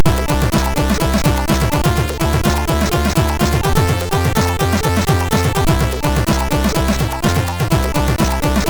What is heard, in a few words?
sid MOS6581; Devilock Jokes; MOS6581R3; hvsc